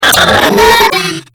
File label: chase; slender; spongebob